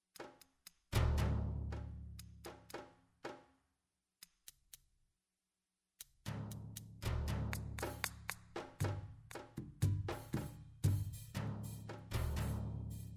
score Desert; Journey

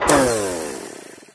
nitro; There; ThereClient; bacio

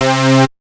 ARP Poly Lead C3, Synths; Multisamples; Ableton Live 12 Lite; Core Library

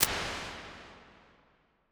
Halls Hall L; Impulse Responses; Berliner; Hybrid; Ableton Live 12 Lite

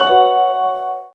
Stab Vinyl Bell; One Shots; Ableton Live 12 Lite; Core Library

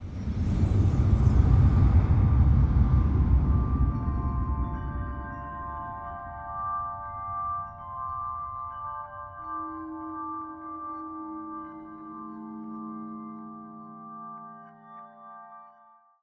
Cave Player Into Ancestor Space; Journey

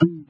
menu close; uisounds; There; ThereClient

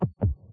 game almost dead; There; ThereClient; gamekit; gunkit

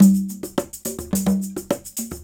Conga and Tambourine 106 bpm; Loops; Drums; Percussion; Ableton Live 12 Lite; Core Library

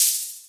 Hihat Open

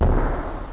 big explosion; Open Zelda; Quest Designer 2.0.4b

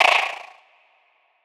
Textures Vocal A; Impulse Responses; Ableton Live 12 Lite; Hybrid

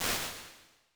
wood room, Large Rooms, IR Samples; Convolution

